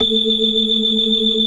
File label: BLOOD OCT, Synth and Organ